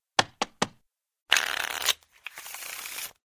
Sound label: shuffle; RS Games Sounds; Blackjack